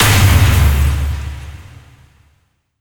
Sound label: Ice Shard Impact Hit